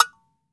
AGO SQUEEZE; AKAI S-Series CD-ROM Sound Library VOL-5

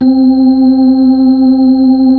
B-3, Brite

